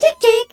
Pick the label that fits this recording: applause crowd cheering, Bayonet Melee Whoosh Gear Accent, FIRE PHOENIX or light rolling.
FIRE PHOENIX